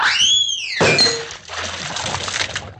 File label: slip on soap and fall into tub